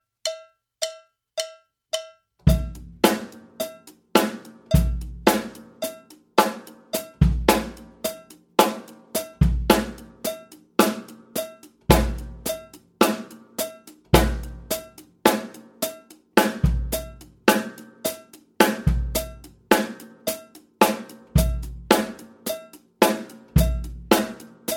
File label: Eighth Note Beat, jazz drumset